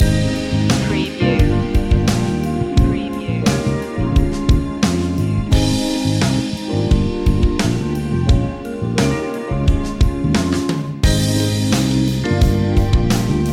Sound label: funky love loop